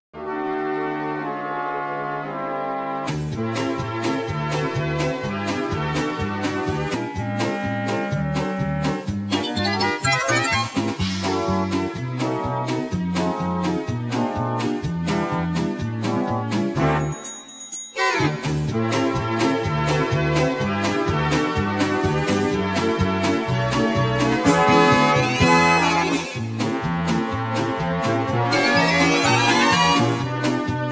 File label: Im a Brass Band INSTRUMENTAL